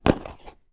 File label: household plastic container case small open